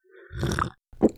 drink

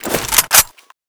ak47 bolt pull, weapons

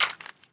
m3 draw, weapons; 590

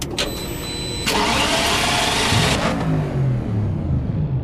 start, vehicles tt; dbs

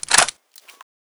ak47 clip in, weapons

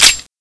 cylinder swap, weapons remington

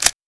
slideback, weapons; model500

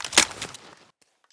clip in

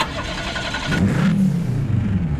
start, vehicles tt

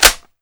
mag in, weapons; amd65

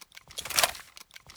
sg550 clip out, weapons; 7615p

